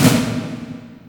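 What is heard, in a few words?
DEATH, AMBIENT DRUMS; Spectrasonic Distorted Reality 1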